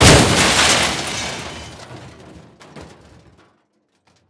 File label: Crash Car Metal Debris; Crashes